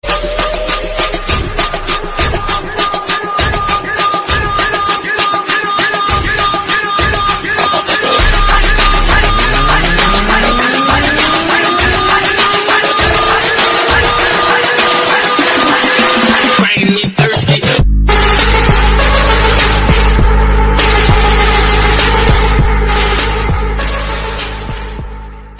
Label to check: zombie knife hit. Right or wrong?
Wrong